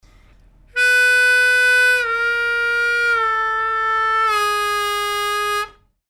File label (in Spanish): bend asp, armonica